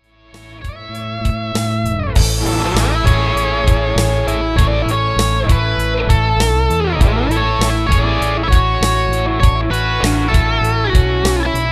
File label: Brit Mk ll; ScrewDriver